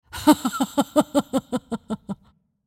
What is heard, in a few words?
laugh; Judicator; Character Voices - English